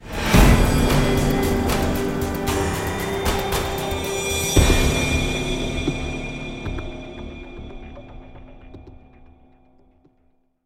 Start Level; Alien Breed Trilogy

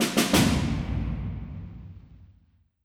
Snare; Quest Snare; Swordmaster

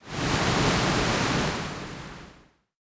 Water

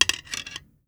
Arrow Nock; Timelock VR

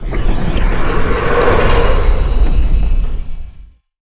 Umekigoe; Yu-Gi-Oh! Master Duel

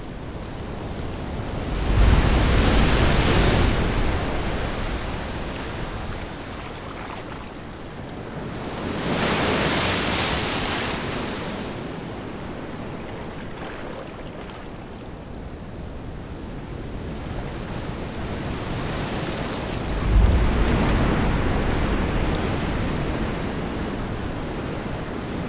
waves loop; inception the app ios

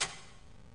score increase; stardunk ios gamerip

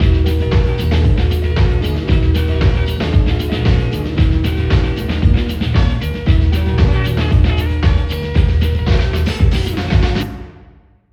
Latin Beat Stadium Music; android; ps3; gamerip; super mega baseball extra innings; ps4 windows xbox one

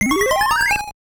Level End; Aladdin

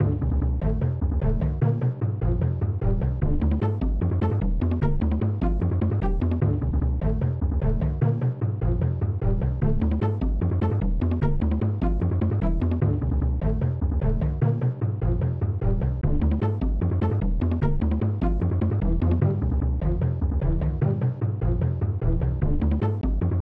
Jungle Rhythm; Pitfall The Mayan Adventure; SNES

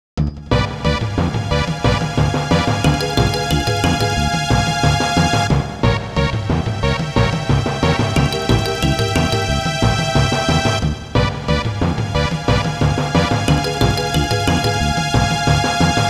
Cave; Estpolis Denki I II Super ROM Cassette Disc in Taito Vol. 1

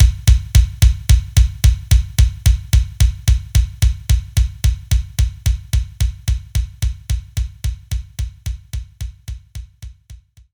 Standard Kick; knights try

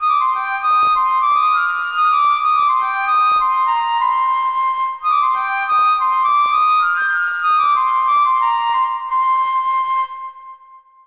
musician song of thanks

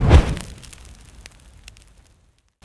popout of lava; Scrat

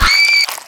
Player Scream Stab

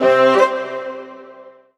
MUSIC EFFECT Orchestral Brass Positive stereo; Kitty Nigiri

